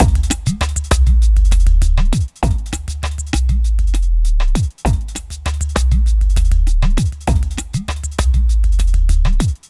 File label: Ethnotronic, Processed Percussion; Sonic Foundry (Sony Creative Software); Rhythmicronics